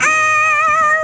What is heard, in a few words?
oooh d#4